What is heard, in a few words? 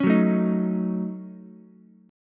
A 6th Flat 5th A down Ukulele; strummed; JGuitar